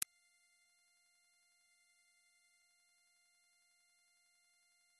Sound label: 4000hz